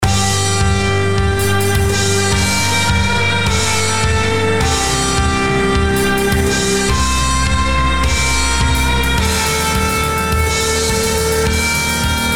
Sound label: Metal